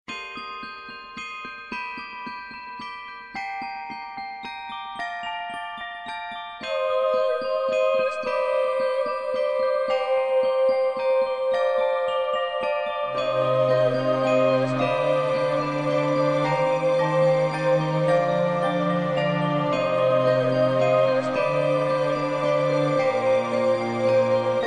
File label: Music Box Gothic